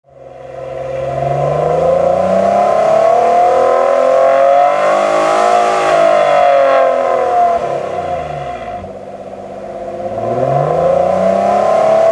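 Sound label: 156 GTA 2 blip, Alfa Romeo; unicorse-italial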